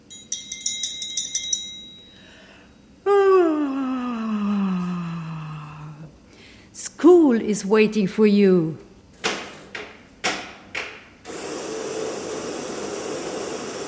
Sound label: jingle school is waiting for you